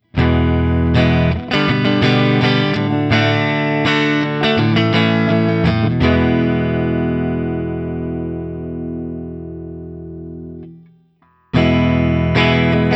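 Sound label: Guild T250 ODS100 Open, guitar; Guild Tele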